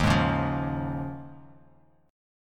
D sharp 7th Suspended 2nd Suspended 4th, Bass Standard; strummed; 11,11,11,10; D#7sus2sus4; JGuitar